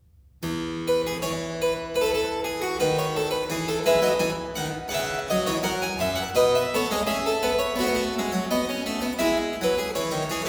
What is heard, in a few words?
Dreistimmige Inventionen in F major, Keyboard Works; J.S. Bach; BWV 794; Johann Sebastian Bach; Inventionen & Sinfonias